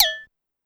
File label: Cowbell Down Zap, Pitch Env; Clavia DDrum-AT; Pitch Env Various